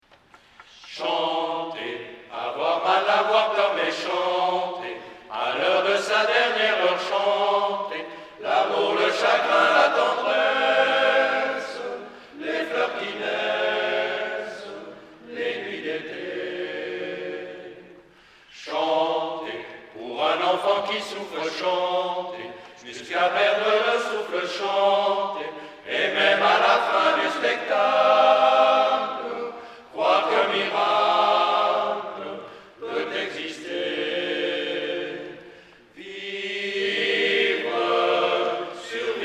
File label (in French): chanter